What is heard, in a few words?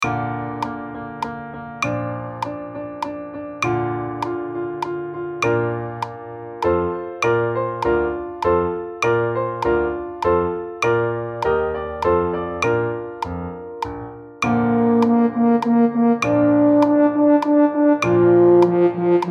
fanfare minuet